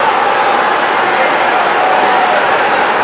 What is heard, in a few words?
Large Idle, Crowd; David Beckham Soccer; XBOX